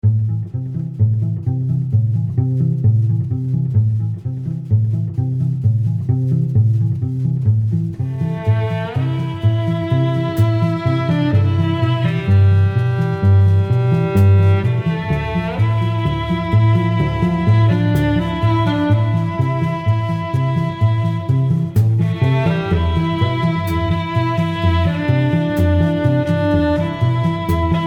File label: close